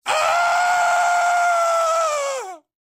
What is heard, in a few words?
Cowboy Boos; Pitch Black Audio Pong